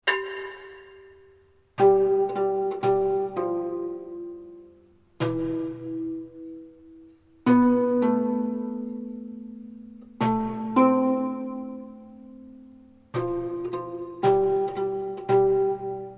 Hurrian Hymn; Puhiya(na); Ancient Sumerians-Egyptians-Greeks

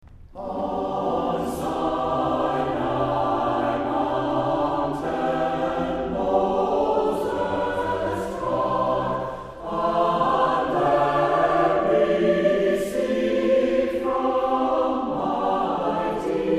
Choir; On Sinai Mountain Moses Trod

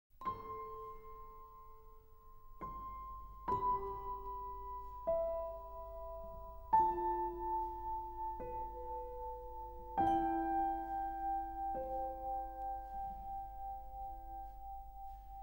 Instrumental

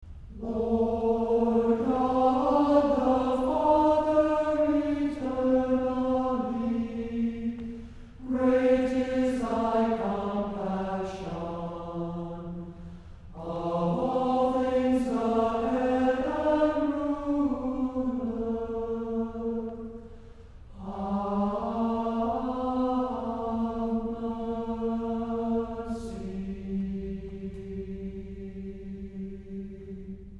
Choir, organ; Kyrie, Lord God The Father Eternally

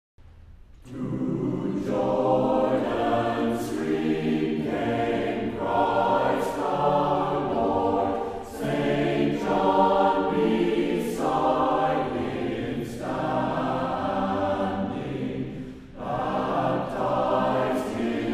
Choir; To Jordan Stream Came Christ Our Lord

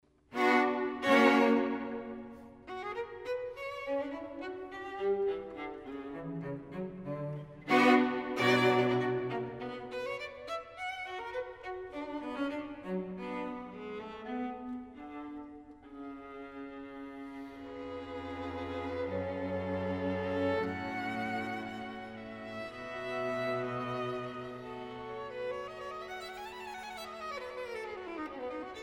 QUARTET IN c SHARP, OPUS 131, Allegro moderato; BEETHOVEN COMPLETE STRING QUARTETS VOL. 9

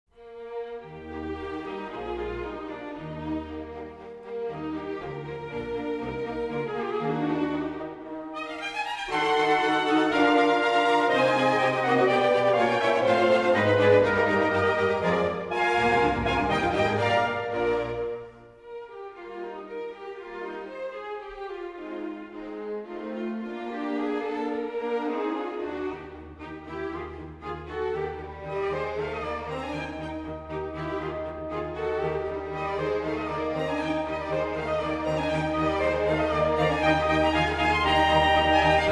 Horn Concerto No. 3 in E-Flat Major, Allegro; Mozart, Wolfgang Amadeus

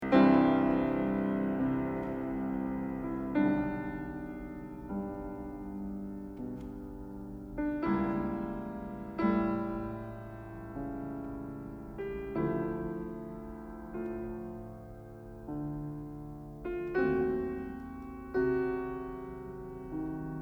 Variations For Piano

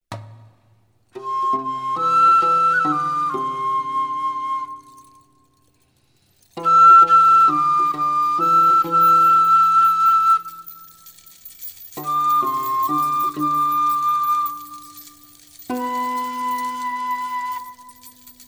Hurrian Hymn; Urhiya; Ancient Sumerians-Egyptians-Greeks

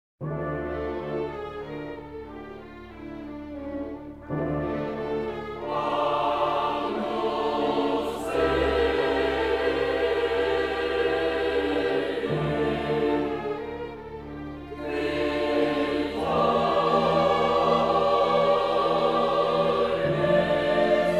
Requiem in D Minor, K 626 XIII. Agnus Dei; Mozart, Wolfgang Amadeus

